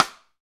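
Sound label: SD, snare drum; Real-Kit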